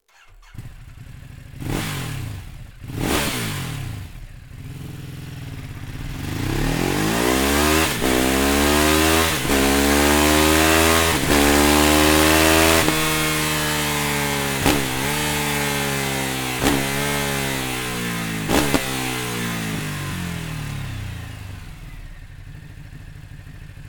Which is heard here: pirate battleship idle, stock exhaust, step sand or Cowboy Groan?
stock exhaust